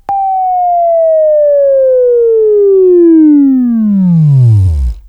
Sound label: Buzz; Labview-Program; Sweeping Training program